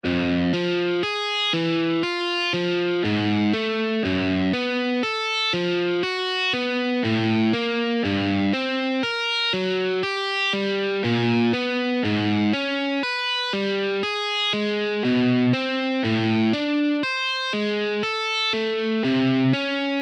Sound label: alternate picking exercises; petrucci